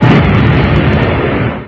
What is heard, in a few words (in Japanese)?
bakudann